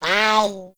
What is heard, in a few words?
Pet Meow; Enemies; Warframe; Catbrow; PetShip